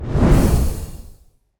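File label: Rocket launch; Hovl Studio; SFX Battle sounds; WhiteMan Unity2D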